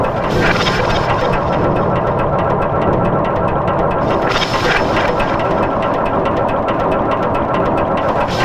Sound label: clockcult gateway closing; VOREStation